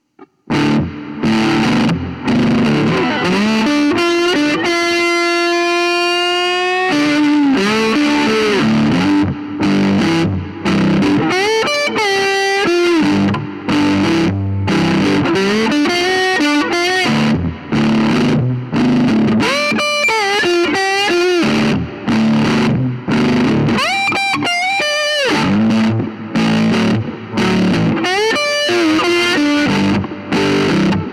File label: slide blues